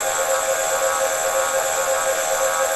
Concert Light Machine

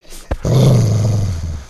Pet Doberman Growl; creatures pets

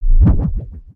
Modular Rebuild Bamf

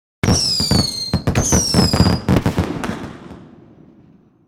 Celebrate

